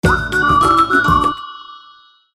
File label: Notification